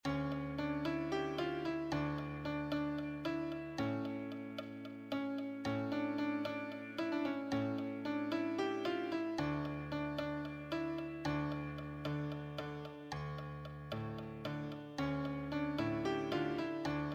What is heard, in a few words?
solo, piano